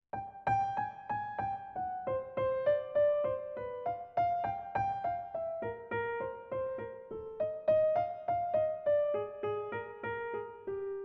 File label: motives; 03 - C Minor 3/4